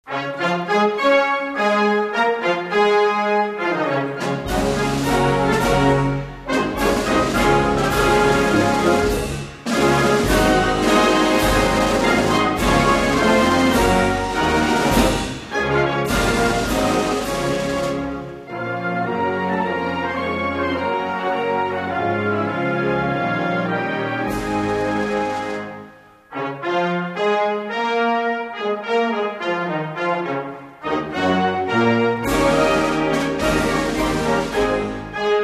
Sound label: national anthem (instrumental); Togo's